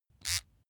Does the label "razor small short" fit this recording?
Yes